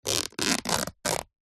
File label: fabric cotton rip